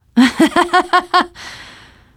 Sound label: laughter, teen girl; player; survivor; voice